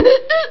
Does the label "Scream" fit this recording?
Yes